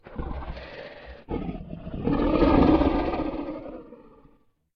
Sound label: Gold Dragon chewing; SyrinScape; Vault of Dragons